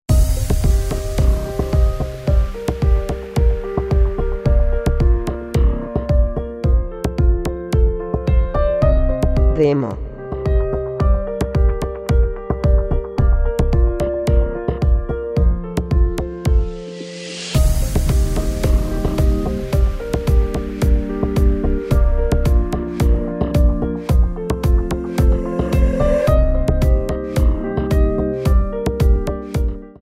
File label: Pop Rock